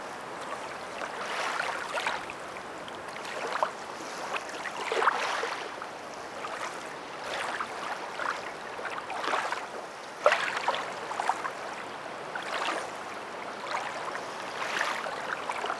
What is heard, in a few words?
Lake waves lapping; Inquisitor 3